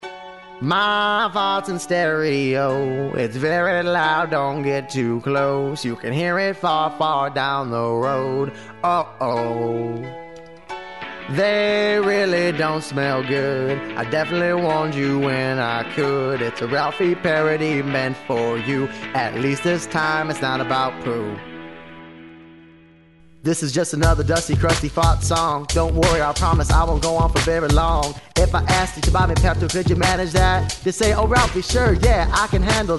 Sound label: stereo farts